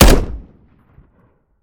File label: weap fire; gmod; viper; weapons; scharlie